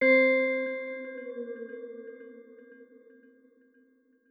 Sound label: timeline clock chime